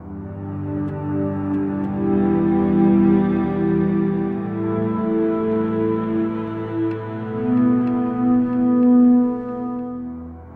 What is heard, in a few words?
Shiva, Acoustic Drone, One-Shot Beds; Sonic Foundry (Sony Creative Software) - Friendly Fire; DroneLoop